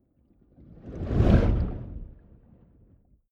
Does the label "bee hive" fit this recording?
No